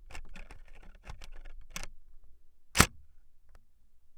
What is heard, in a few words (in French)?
ARMEMENT-DECLENCHEMENT RAPIDE